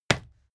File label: fight impact sweetener solid